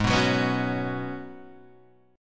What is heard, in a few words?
Gb Suspended 2nd Suspended 4th Ab